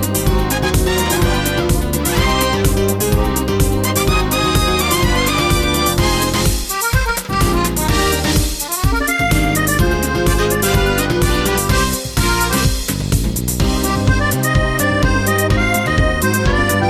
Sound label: flame Of Love Loop; butterflysoup2